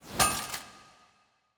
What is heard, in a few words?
ambience room forge armor ready